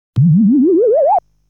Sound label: Smoke appearance sound; legend of hero tonma